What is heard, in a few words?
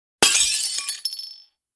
plate breaking